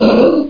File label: hurt, knight; Quake